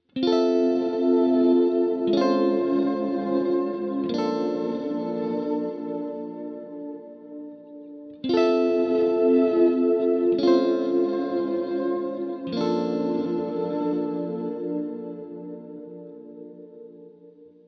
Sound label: WALRUS LORE Chords